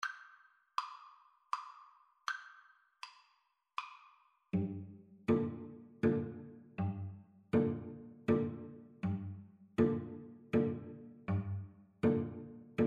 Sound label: sicilian don, Violin-Cello Duet